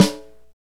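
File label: snare, Medium Rock; Northstar; Drumscapes Roland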